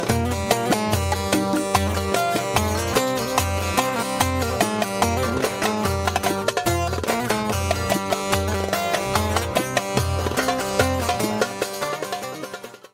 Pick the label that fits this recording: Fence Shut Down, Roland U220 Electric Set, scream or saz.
saz